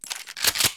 Shotgun

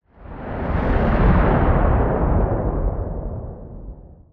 distant rumble

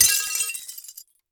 window break

